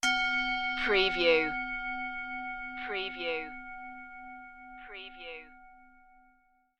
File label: tibetan bowls meditation stinger